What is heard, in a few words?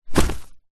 bag teachers fabric containing books and paperwork set down on ground; foley